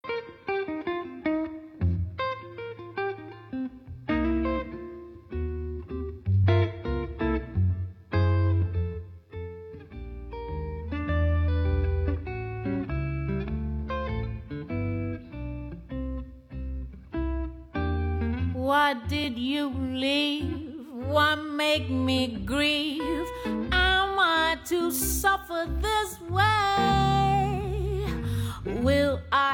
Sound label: Jazz Quartet